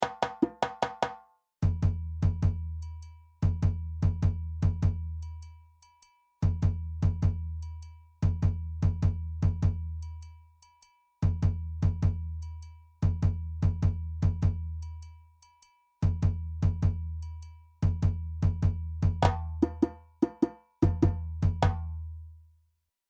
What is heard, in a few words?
Doundoumba